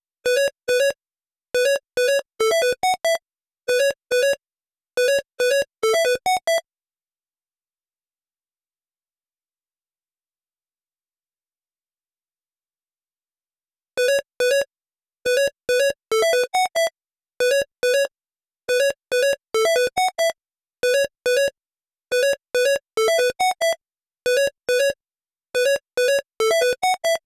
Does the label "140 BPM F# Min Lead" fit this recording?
Yes